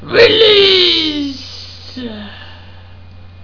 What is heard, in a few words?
death; Alien Arena; drfreak